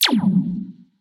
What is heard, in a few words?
reels start; Back to 70s; wazdan